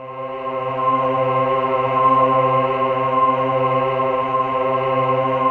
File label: JV TRON CHOR; VINTAGE-EXP